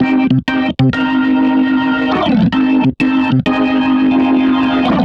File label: Organ, 95bpm, C2, analogue circuit; musicradar